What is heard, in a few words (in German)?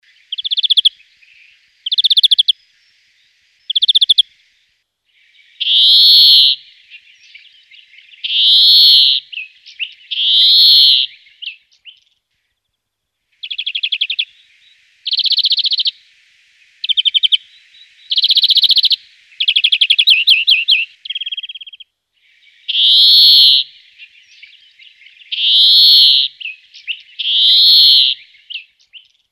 Gruenfink, vogelstimmen; vogelstimmen-trainer